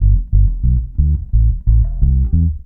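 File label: reggae bass G; Best Service Advanced Media Trax Modern Composer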